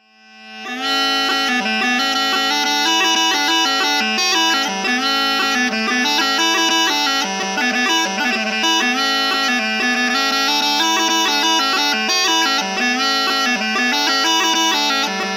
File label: Broken Knee, Jig, bagpipes; In reference to my own gammy knee.; Big John Bagpipes